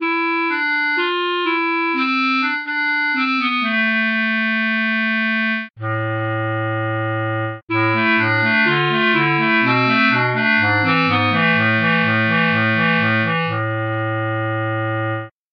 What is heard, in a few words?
clarinet